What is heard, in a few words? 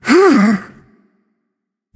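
sigh, female; smite; voicepack; izanami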